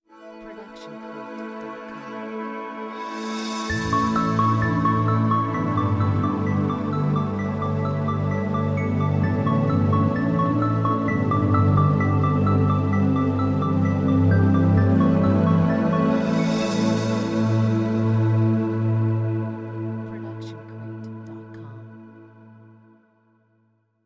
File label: Corporate Ident Company Logo; SoundsCrate